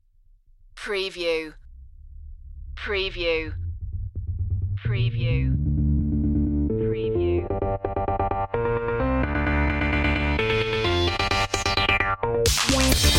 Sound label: fast beat gamer